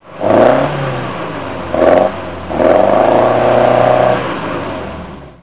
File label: morgan